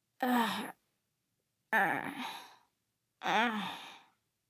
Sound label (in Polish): Voices Oddychanie; don t be afraid the first toy